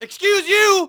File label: yell; Matt Hoffman's Pro BMX 2; XBOX